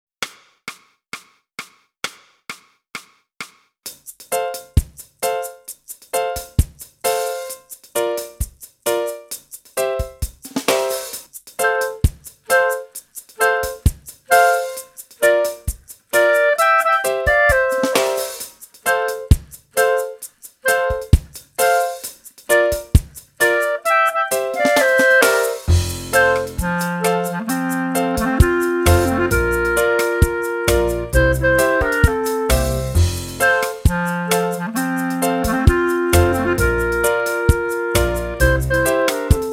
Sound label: clarinet, crayfish; Boosey Woodwind Method: Clarinet (Book 2)